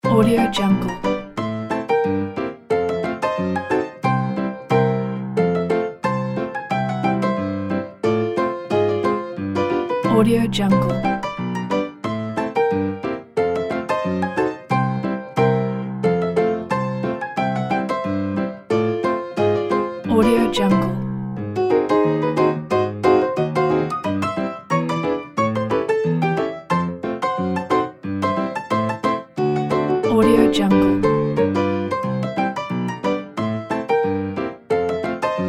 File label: summer ragtime